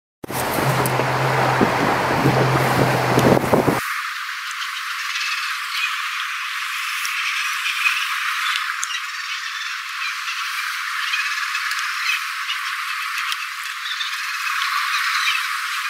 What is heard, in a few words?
Fairy prion